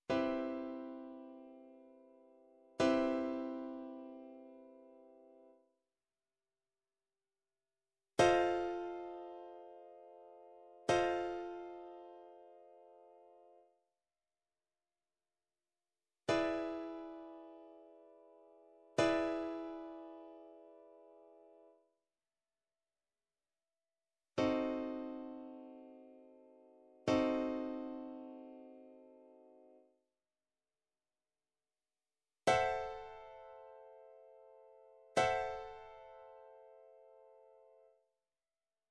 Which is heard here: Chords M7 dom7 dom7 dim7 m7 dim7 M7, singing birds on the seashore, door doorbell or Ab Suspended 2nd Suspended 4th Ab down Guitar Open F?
Chords M7 dom7 dom7 dim7 m7 dim7 M7